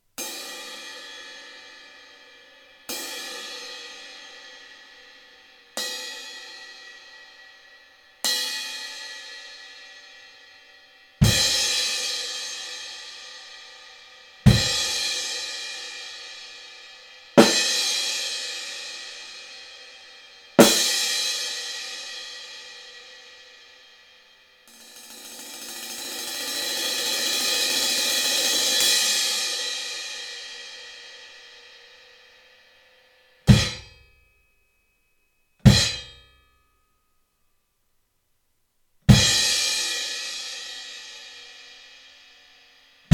Classic Crash; 17; Amedia

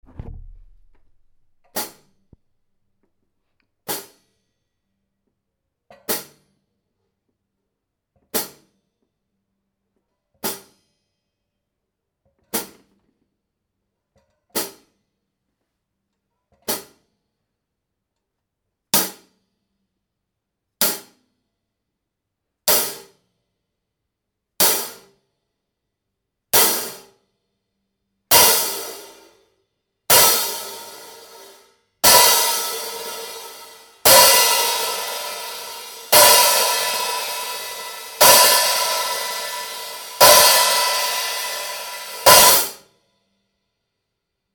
Classic Hihat Flat Hole 14; Amedia

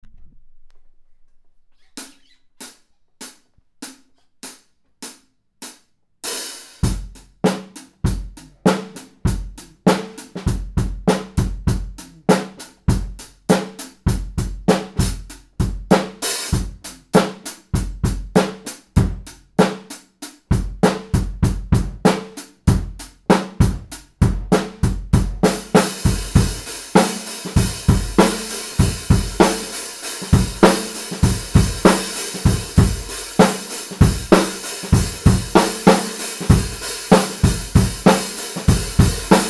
Hi hat 13; Amedia Vintage

